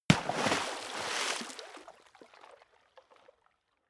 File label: body fall water deep; krieg-waffen; nahkampf; soundpool